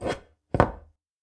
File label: Spend Loot